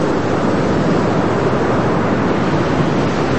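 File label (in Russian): водопад далекий шумный приглушенный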